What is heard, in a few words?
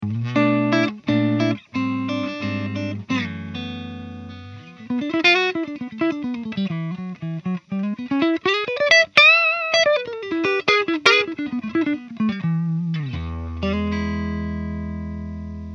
sound sample country riff